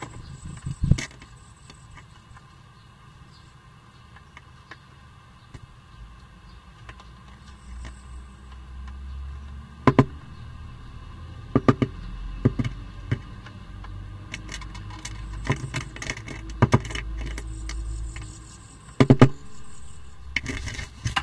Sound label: nest wiggles